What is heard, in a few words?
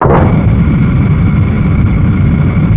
door stone move; svencoop